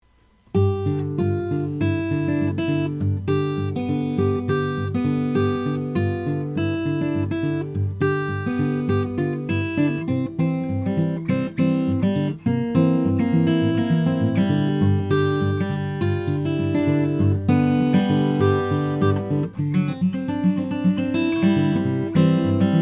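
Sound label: maingard om cutaway brazilian rosewood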